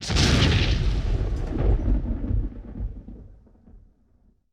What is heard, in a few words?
WWII Explosion With Debris, Period Combat; Sony Pictures Sound Effects Series